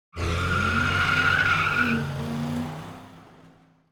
Car tire skid fast tire skid on asphalt with squeal